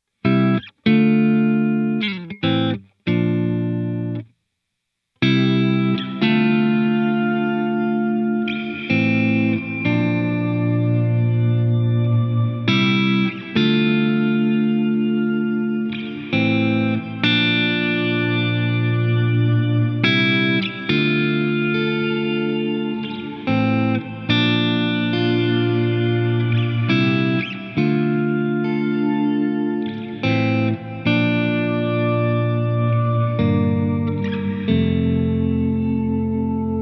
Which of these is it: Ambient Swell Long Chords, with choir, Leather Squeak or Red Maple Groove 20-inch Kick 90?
Ambient Swell Long Chords